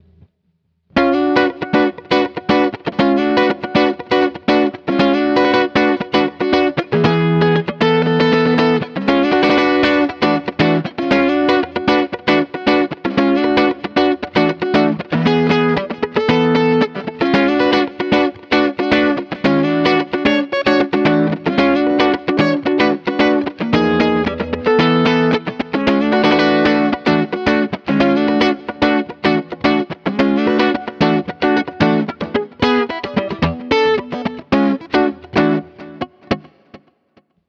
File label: Nanomag funk